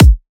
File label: Riddim Kick; One Shots; Ghosthack; Riddim Essentials 2020